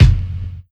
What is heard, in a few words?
prominent kick sound f key